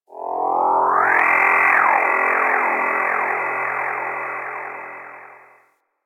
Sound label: Ship Data Low Drones; Ambience; Warframe; Corpus Ship Remaster